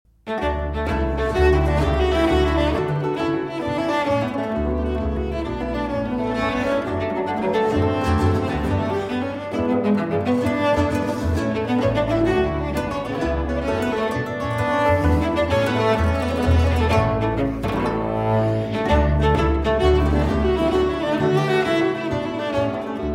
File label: Cello Sonata No. 7 in D Major, Presto; Platti